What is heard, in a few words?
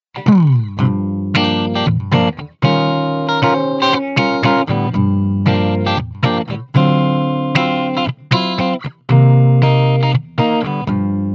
Electric; API